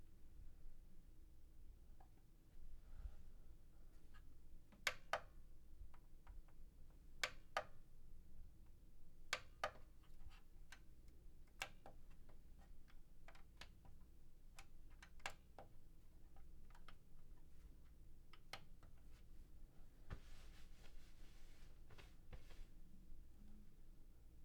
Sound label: Set top button presses